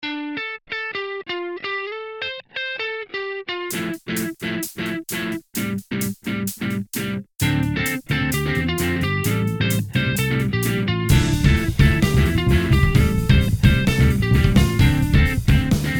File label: indie rock